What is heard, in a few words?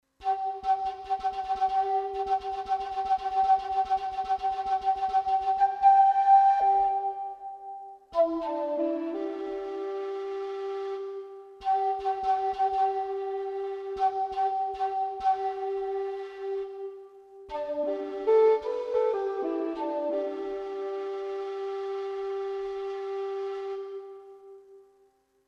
Kiowa Flute; Artinoise Recorder